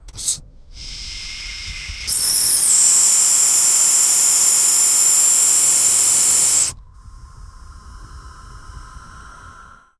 the sound of an oxygen, escaping

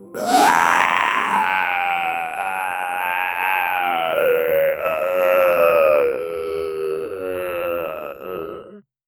creature vocal

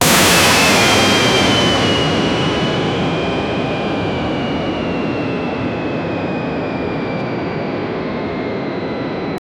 missile launch from fight

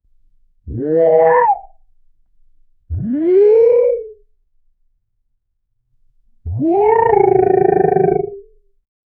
aquatic animal reptile amphibian

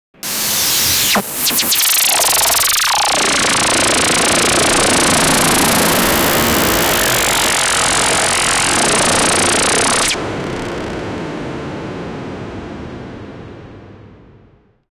choppy fast forward sound; motion

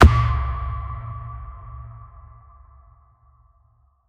dynamic impact sound

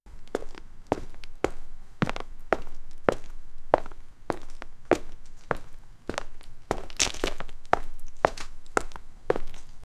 human footsteps sound, far

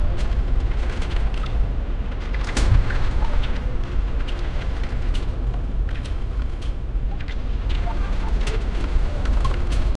medieval harbor ambience

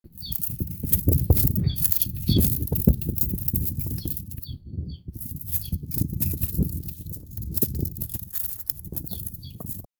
the sound of fog birds; arrow

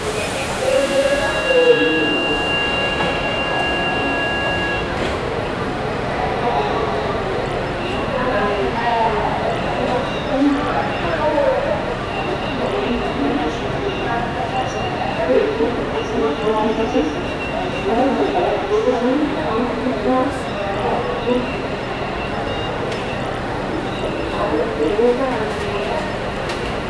ambience of a busy Indian, announcements

